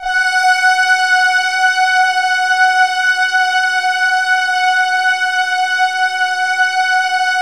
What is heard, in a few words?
F#5, Keypad; Standard Instr; Keypad 1